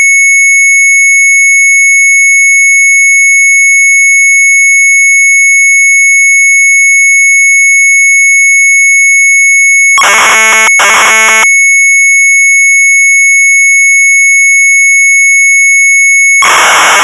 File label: MZ800 Tape, sharp; HONDJES; retromelsarchive